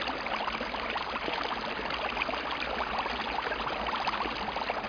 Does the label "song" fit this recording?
Yes